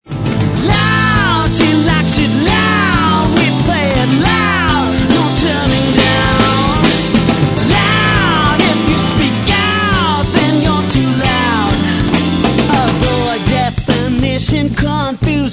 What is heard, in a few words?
LOUD; Gigland Records